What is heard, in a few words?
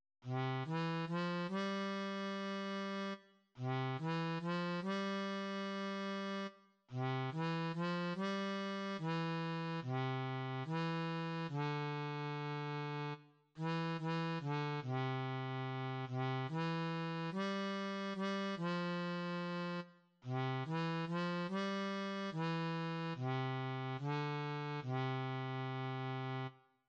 When the Saints go marching in, harmonica; Do